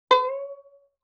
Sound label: Violin Pluck Pitch Rise; Musical Elements